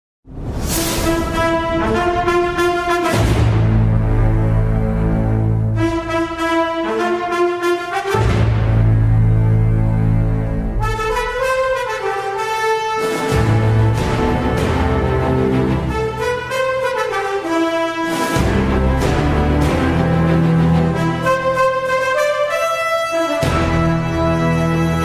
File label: entry bgm ringtone; bigil; rayappan; wizard beatz